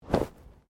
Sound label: Bodymovement Clothing Slide; Body movement foley to simulate falls and deaths; Dressing; Human